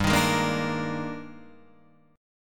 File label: D sharp Major 11th, G, guitar chord